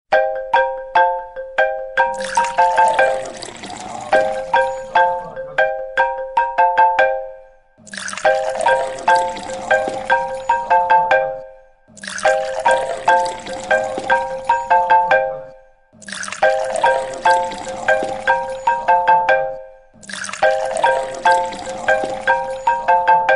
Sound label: iphone 11 pro water, ringetone